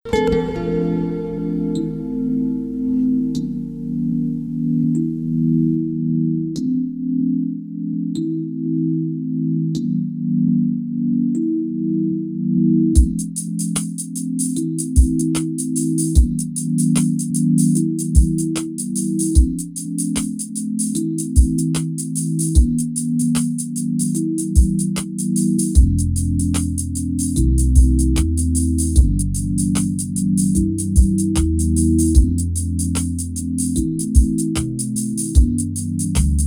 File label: chillout, 432 hz; sweet smell; Słodki zapach